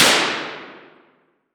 gun rifle m1 carbine single shot interior shooting range